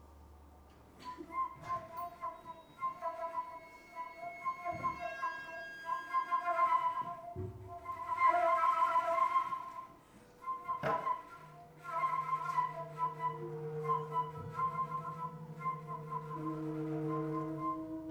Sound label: Flute